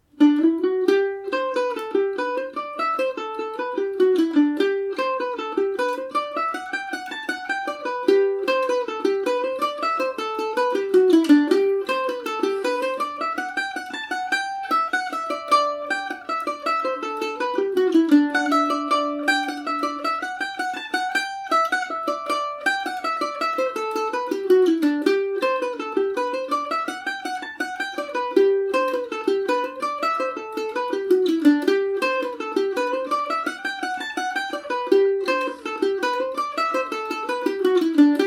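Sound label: G major, Irish mandolin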